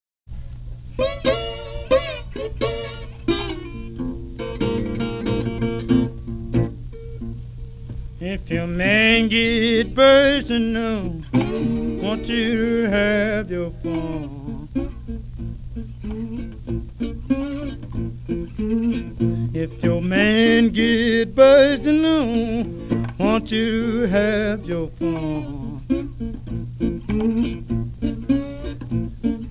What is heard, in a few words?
Blues